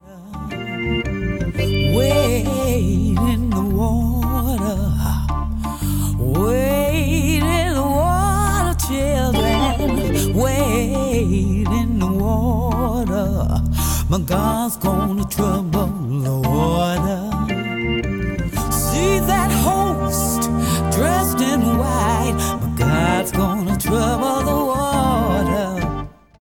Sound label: gospel choir wade in the water